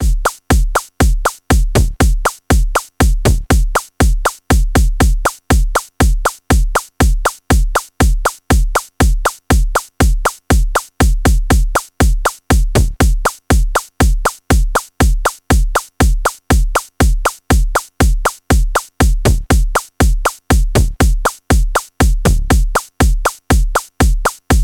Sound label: Transient Plus Sample Select Beat; Future Retro